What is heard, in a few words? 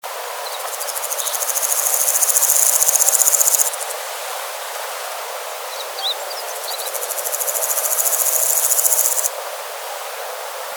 bug